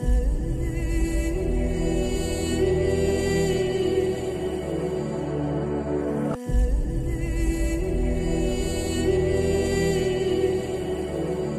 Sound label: flute bgm ringtone